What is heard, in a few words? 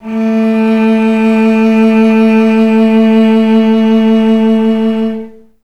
CLS LGP A2, Advanced Orchestra; Best Service; Peter Siedlaczek's Advanced Orchestra